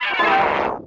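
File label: droid attack light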